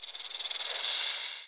Die, Spinner

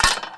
GrenadeHit

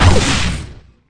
Mag Amp Impact

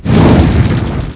dragon fire; Unreal

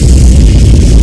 fire wind

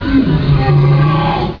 roam, Dragon sounds; Unreal

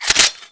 Rifle Load

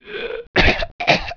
cough, nali

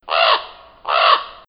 raven sound

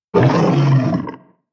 Growl Short; doggiecat; Unreal; Firestorm 2014

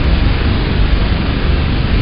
Fire Loop

